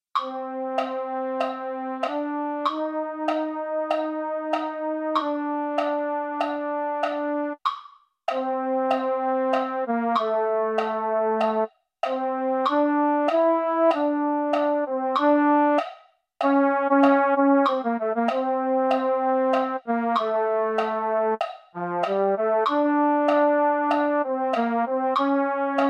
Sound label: baritone, MIDI